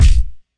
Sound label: tuddle kick; Hip Hop Specialty Kit; STYLE KITS (Hip Hop, Etc)